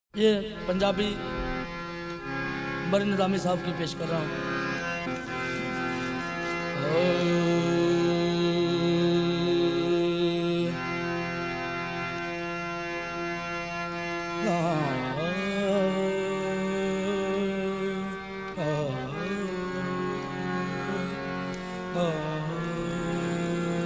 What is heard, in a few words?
Panjabi